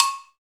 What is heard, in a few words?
MINIC, Roland; Club-50 - Foundations Roland; CowBel+Metl